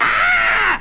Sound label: scream